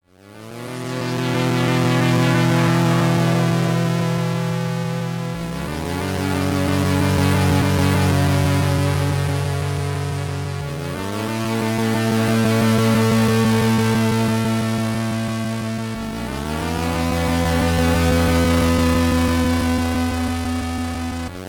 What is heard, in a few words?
Synth Bit Section